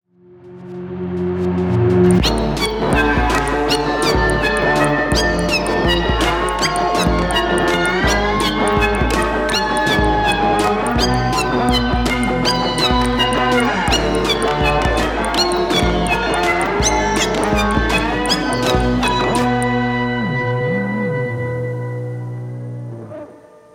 soprano saxophone; wash my hands of the soprano saxophone; weeklybeats-2020

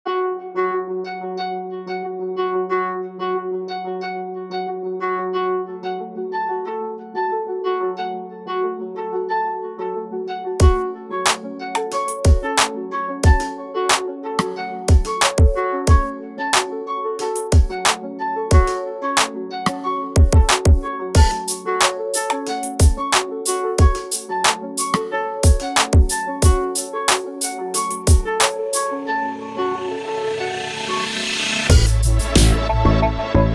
beep boop; weeklybeats-2020

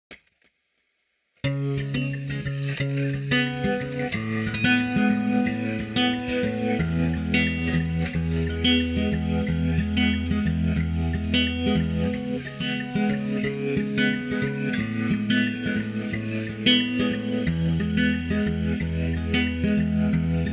guitar; im still bad at guitar; weeklybeats-2014

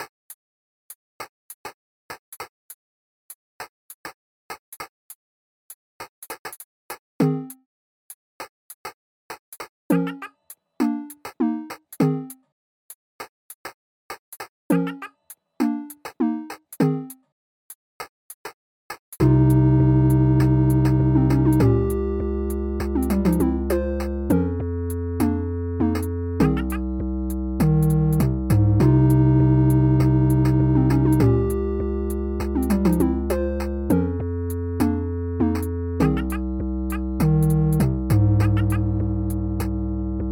chilling in a car wash, write a short jazz ditty

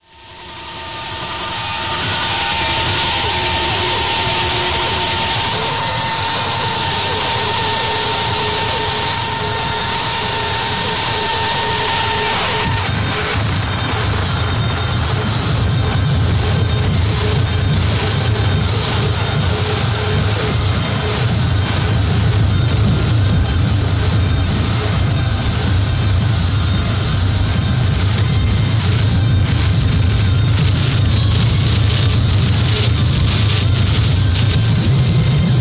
noise jam; weeklybeats 2014

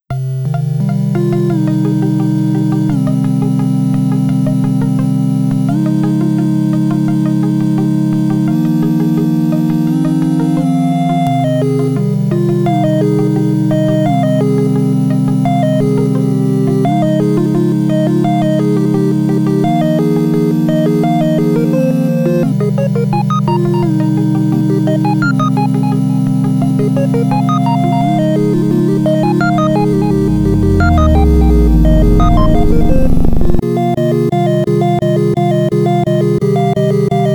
hardcore in f minor; weeklybeats 2026

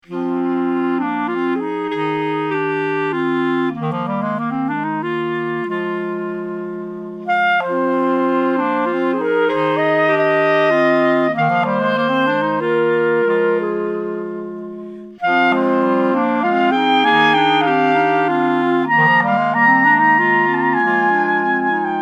clarinettery; weeklybeats-2020

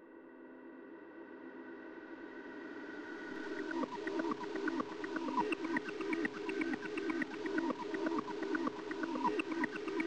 pocket operator renoise thing; weeklybeats-2020

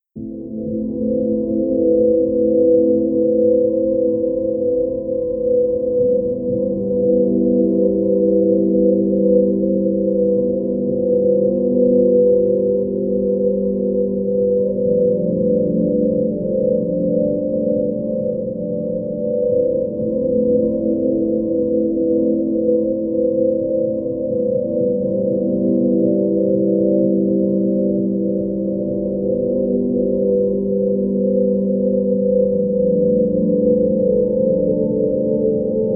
wind and rain

